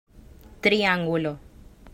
triangulo

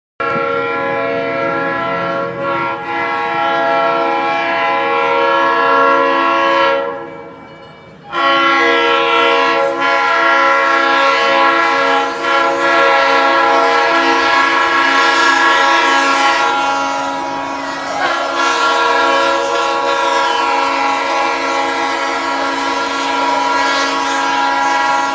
Sonido de la máquina Cementera; Del Cruz azul Sangre Azul

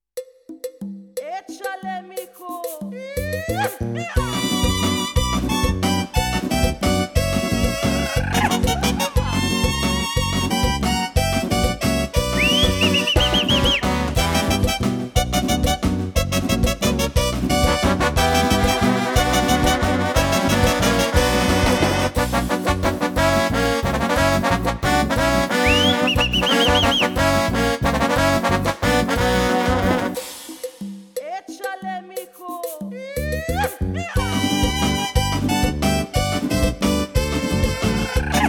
huapango banda, MEXICO

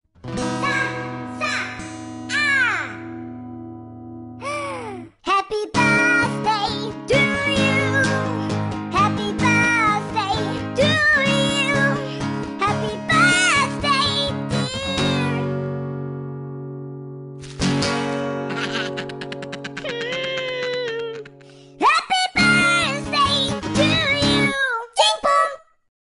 cancion feliz cumpleanos divertida

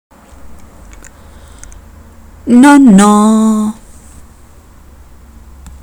Respuesta incorrecta

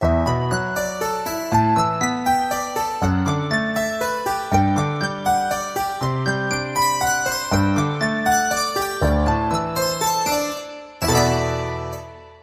orquesta sinfónica

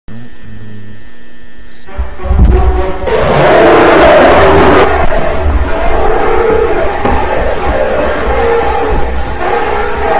Sonidos del monstruo; The Backrooms Entity Sound Level 0; Efecto Sonido

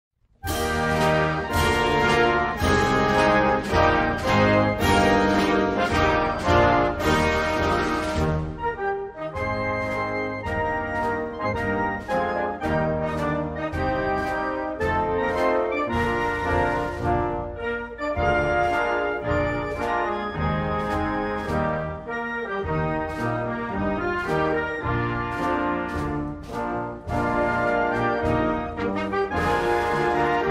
Himno de Portugal A Portuguesa

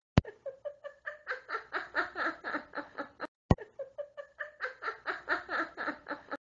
RISA DE BRUJA

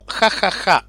jajaja

